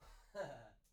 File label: laughter; IEEE AASP